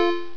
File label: 4 beep; Goldwave